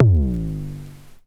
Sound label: tom, One-Shot Drums; Dubstep; Rimshots & Toms